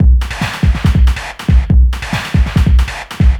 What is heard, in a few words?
Beat, Industrial; Sonic Foundry (Sony Creative Software)